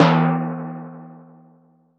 Timpani, Percussion

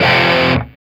F#3 SOLDANO, ROCK, Guitars; Spectrasonics; Hans Zimmer Guitars VOL-1

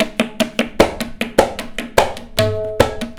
TABLAS, Indian Traditions; USB Soundscan vol.45 - Indian Traditions; AKAI

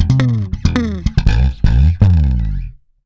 FUN FILLED, Bass, 80 BPM; Sampleheads; Ultra Freakin' Bass

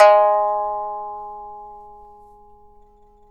PIPA G#2; INIS; Opium

